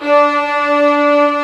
VIOLAS A2; AKAI S-Series CD-ROM Sound Library VOL-2

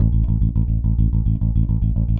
Sixteenth Notes A, Bass

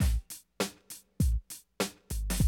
drum loop, 100 BPM; Best Service; XXL 1500 DrumLoops

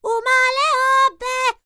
Vocal Planet World Voices, NOMADIC

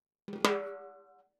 Timbali, Latin Percussion

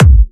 Trance Kicks, Bassdrums

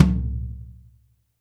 TOM; Zero-G; Chemical Beats

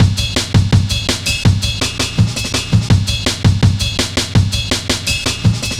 Samurai, Drum Loops, 165.5, Jungle

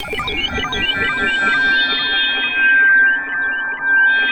G2 REWIND; NorthStar - The Wizard VOL-2

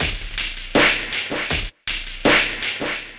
Filter Grind Loop, Drum Loops Processed; Zero-G Smoke

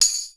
TAMBOURINES, Percussion; BRASS

